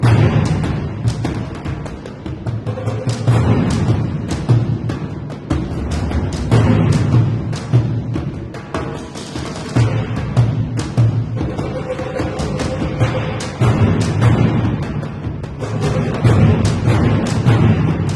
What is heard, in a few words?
Battle Music; fantasy forest story